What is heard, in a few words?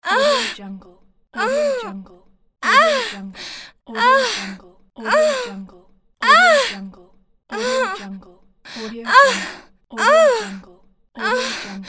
female moans